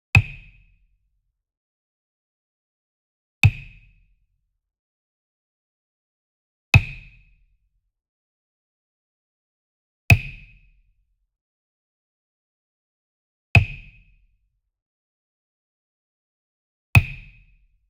Wood Percussion Wood Block Japanese Resonant Hit Near; boom library; Cinematic Strikes Construction Kit